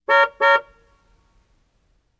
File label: Car Horn Old Dbl Natur, american